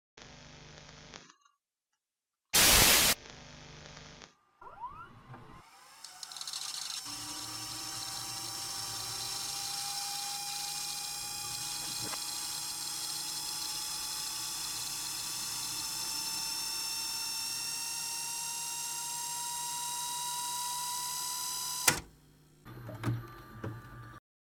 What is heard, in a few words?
Vhs Tape And Machine Rewind High Speed Real Sounds With Tv Static Effects